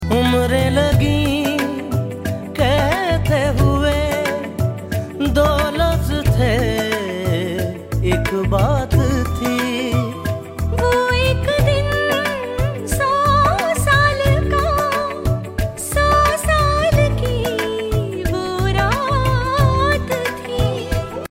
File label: Ringtone